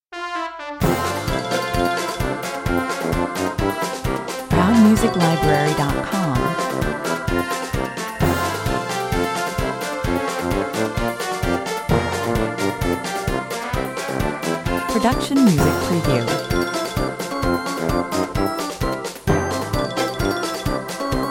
Just like the title says, a good and proper piece of pompous Circus music, 130 bpm